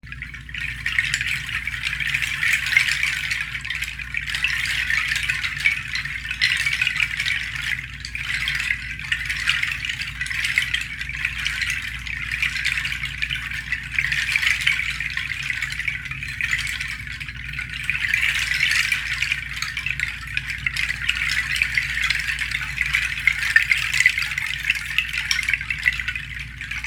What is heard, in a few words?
Pangi moon, rain water sounds, seed shakers; pangi seed wind chime with bamboo moon handcrafted in bali